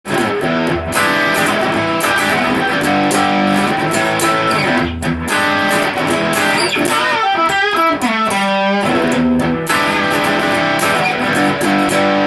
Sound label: epiphone guitar, les paul custom ebony epiphone